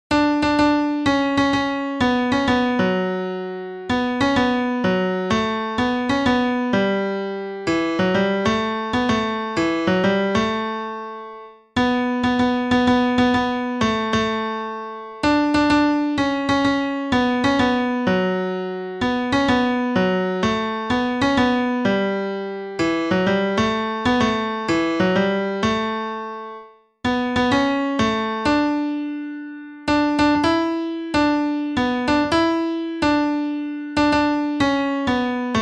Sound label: Melody Piano